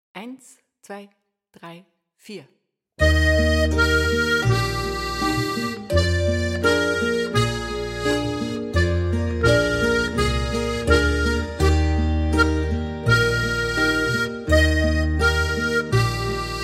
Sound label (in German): Advent Boarischer